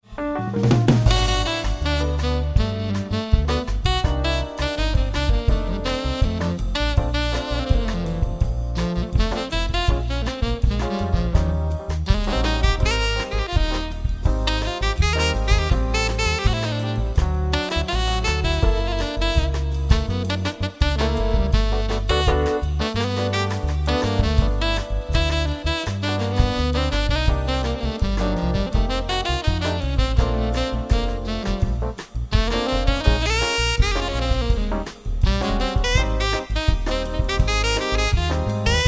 Sassy Samba Solo

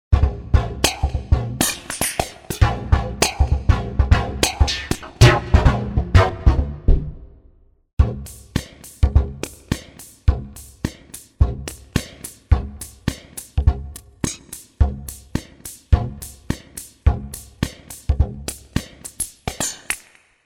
Drums; TURBO VL

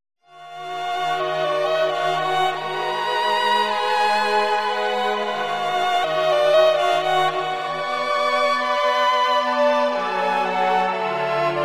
Strings Solo

